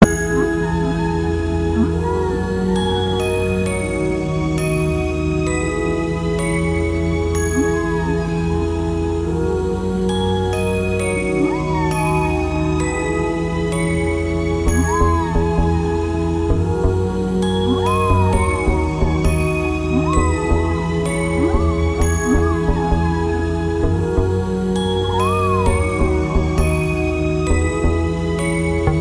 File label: Breakbeat Melody